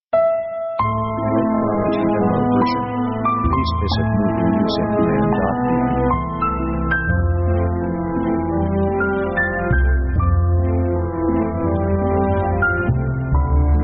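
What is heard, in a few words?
orchestral melodramatic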